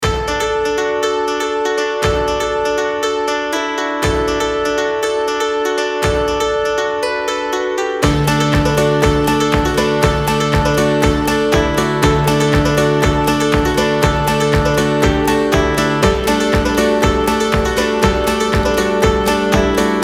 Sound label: indie folk, happy, adventure